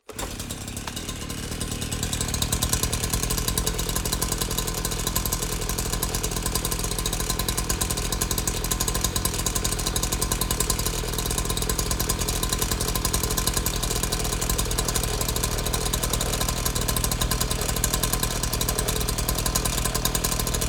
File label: Gore Chainsaw; Just Gore 2; soundbits